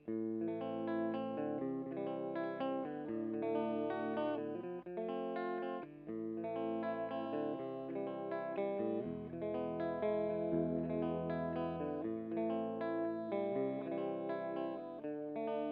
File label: ReAmp